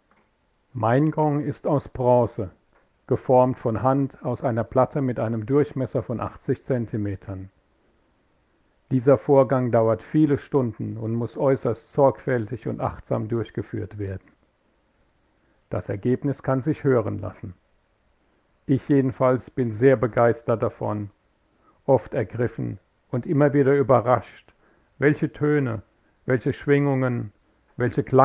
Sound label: gong